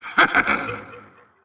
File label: laugh; razor